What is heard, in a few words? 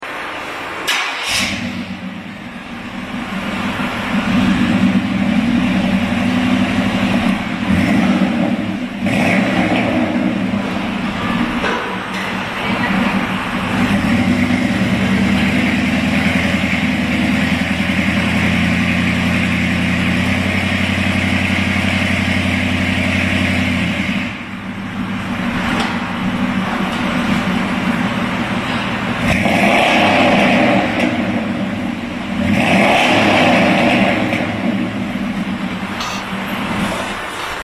chevy 350, custom exhaust sounds; 97 chevy1500